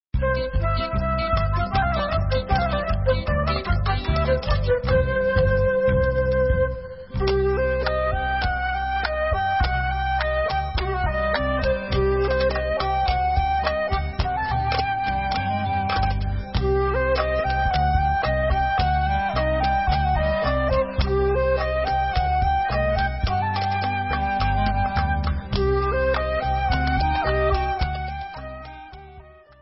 Hora Chassidit Instrumental

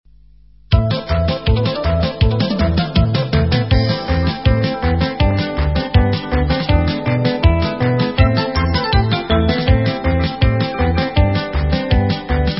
Instrumental

